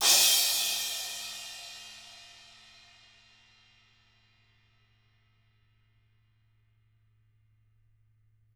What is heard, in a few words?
CRA 18